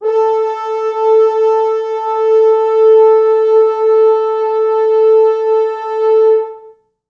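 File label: 4 FrHorns SUS, French Horns; A3; East West; Quantum Leap Horns Sax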